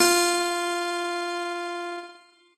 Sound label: piano; pianojs